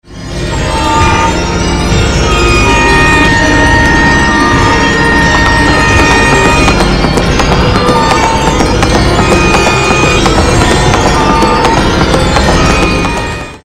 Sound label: trolly horse